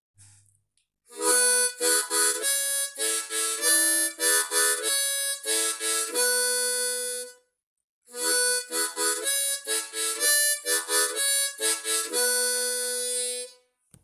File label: rhythm